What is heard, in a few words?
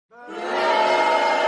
ricochet bullet; SFX